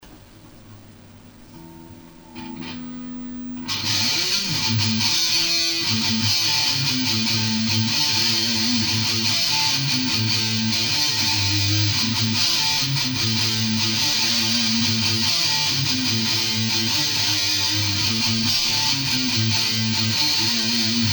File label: metel riff